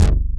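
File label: double bass; Pro-Rec - Synthsonic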